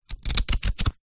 household book very small pages flick through

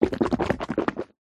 human male swishing water around in closed mouth

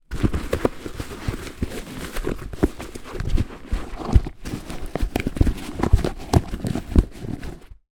shoe work boot handling; zapsplat

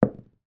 die throw on carpeted floor

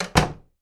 household door wooden internal shut; sound effects one

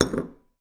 wine glass down on table drunkenly, household

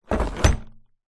industrial power tool zip case set down on table

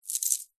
industrial chain small light movement short

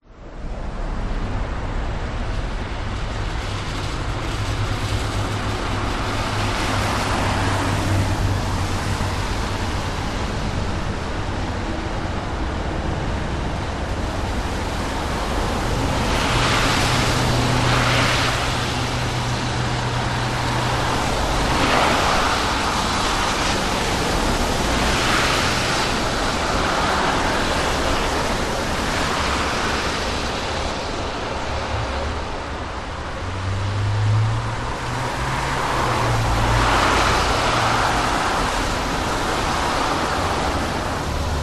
traffic highway wet busy; vehicles

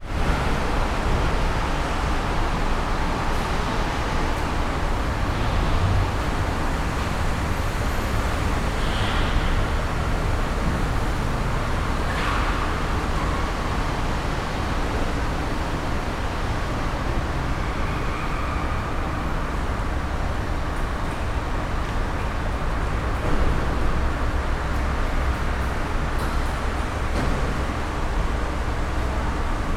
ambience urban car park multi storey internal; zapsplat

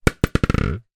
motorcycle helmet down on ground bounce; transport

